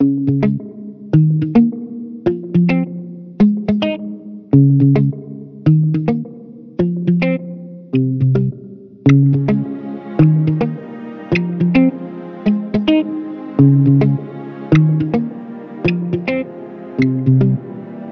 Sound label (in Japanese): ギターミュート